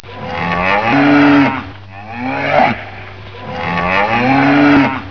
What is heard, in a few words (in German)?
MUH